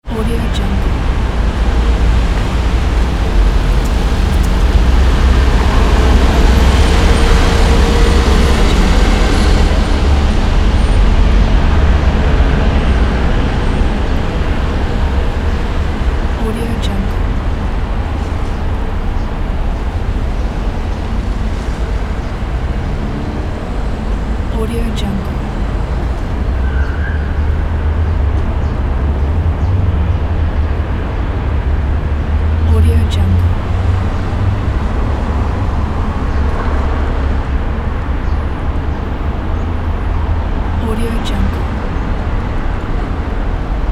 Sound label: city traffic and wind